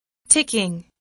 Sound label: ticking